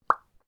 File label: chat seen